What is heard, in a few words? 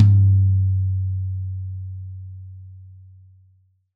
PR14TMD SL, drums toms dry; Real Mega Drums